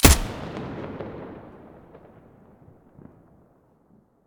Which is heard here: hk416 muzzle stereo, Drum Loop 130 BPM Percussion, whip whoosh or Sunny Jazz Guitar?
hk416 muzzle stereo